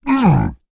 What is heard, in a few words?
Creatures Fight Damage; Mighty Party; Android